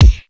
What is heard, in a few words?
KIK